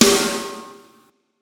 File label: Bronze bar Snare